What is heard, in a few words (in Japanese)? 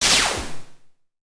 プチュン トランジション ノイズ ザラザラ